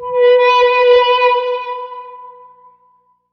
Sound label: philter (acidstring); Sonic Foundry (Sony Creative Software); Ugly Remnants VOL-2